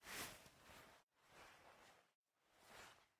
Cloth move; miside demo

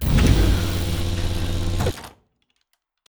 table unhide

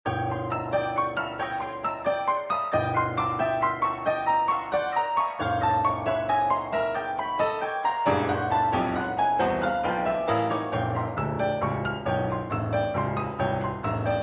stems dramatic piano; Among the Sleep

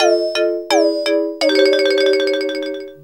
Race Failure; Super Mario Galaxy 2; Wii

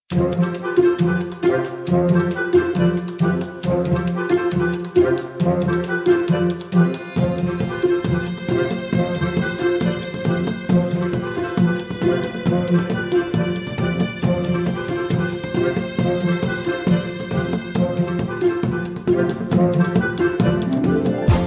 Sad Tap; Pou

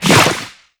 Toss Splat; Nicktoons Nitro; arcade

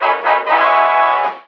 Objective Completed

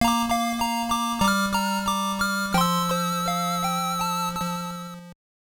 Unknown Jingle; Moldorian Hikari to Yami no Shisutaa; Game Gear

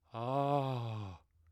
Startle

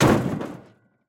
Impact

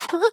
effort; Scrat

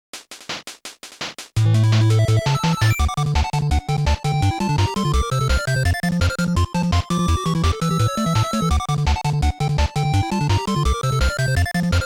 Battle BGM; bomberman the music

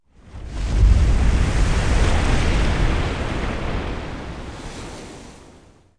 waves start; Inception The App

